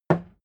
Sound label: hammer wood